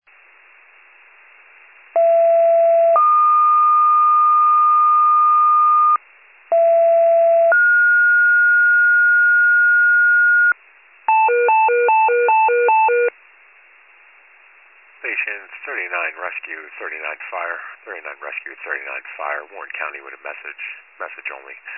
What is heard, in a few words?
fire, pager alert